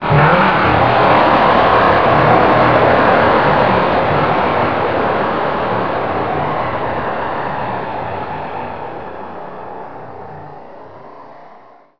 death, eidolon; quakemapping

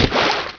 ogrefish in

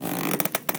lad rope, player; quakemapping

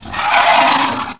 perch, gargoyle; quakemapping; mod xj18

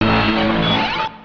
stfire; quakemapping; mod quoth

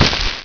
hit, raindeer; xmas; quakemapping

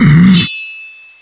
sight, zombie; quakemapping; mod xj18

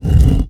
idle, golem; quakemapping

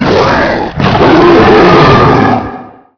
quakeatk; mod quoth; gug

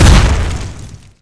bile, gug; mod quoth

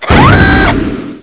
nail; sentinel

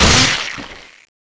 mangle; mod quoth

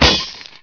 miss

